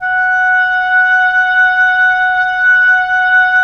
Oboe; Roland - LA Composer vol. 4

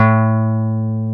Jazz Guitar; Club-50 - Foundations; Jaz-Kessel

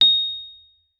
MK1F A#7, RHODES; Xsample VOL-1

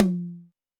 Tom Hi Dbl, Alesis D4

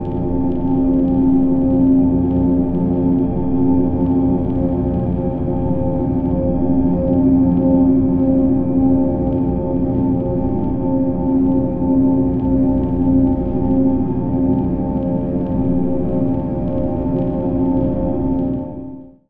Wastelands, Atmospheres; Zero-G Ambiosis

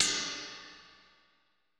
ride, Roland MC-909

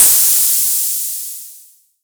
SERGE Hat Open, drums; Polyend; Stazma & Concrete Collage; Concrete Collage Drum Kit; Concrete-Serge Drums

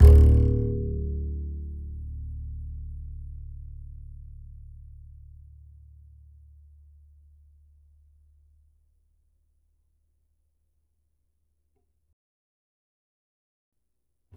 E, Upright Acoustic Bass; 1ST POSITION; Larry Seyer

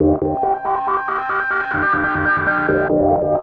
Atonaloop, Industrial Analog

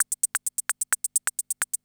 CR78

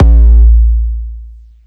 sub, sub bass; Techno ID; subbassdrums

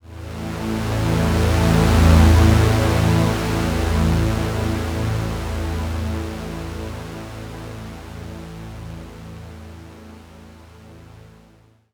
Analog Fade In; SFX & One-Shots

